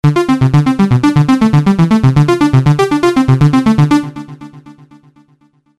saw

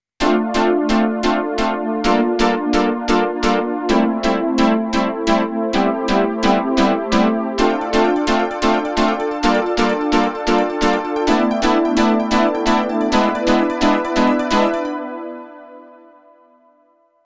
akai bounce

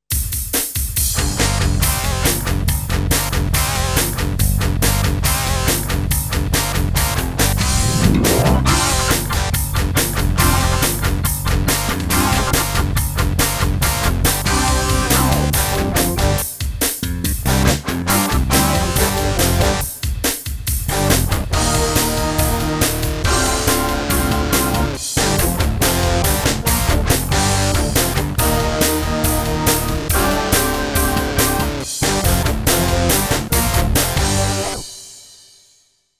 rock gtr

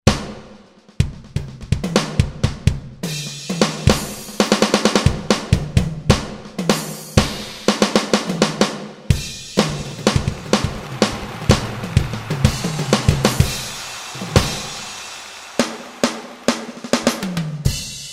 rock drums

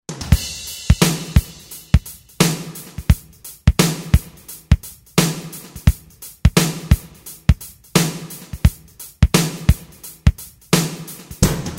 pop drums

